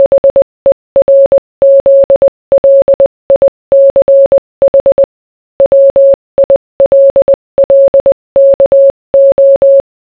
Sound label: morse